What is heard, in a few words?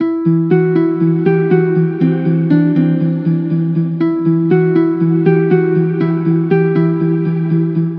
Musical Loop 120 E Minor; Comprehend; Ghosthack